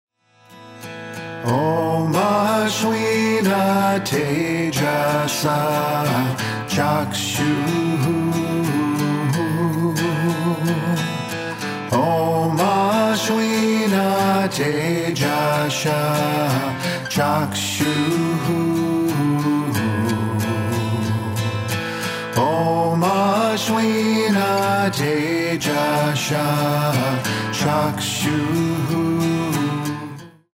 Om Ashweena Tejasha, Sung Mantras

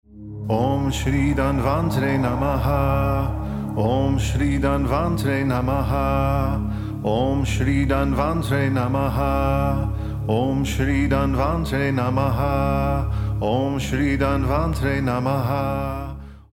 Om Shri Dhanvantre Namaha, Mantras

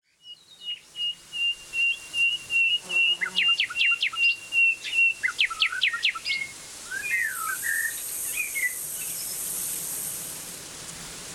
Summer Meadow

